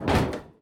robot step

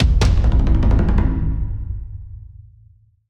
Stinger Player Death PERC one shot; skykeepers; ps4 windows xbox one gamerip 2017

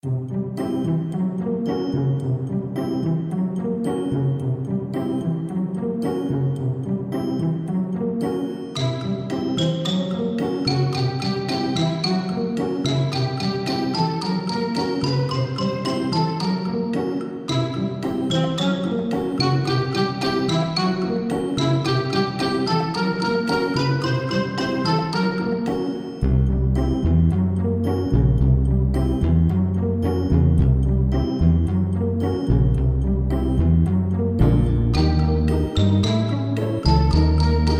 Kids Video Game; Last Dream World Unknown

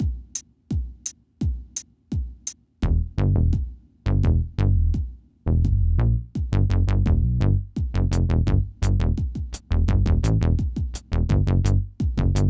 Mus Coop Generic Combat Low

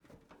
metal jump; teardown ps5 windows xbox series xs gamerip 2022

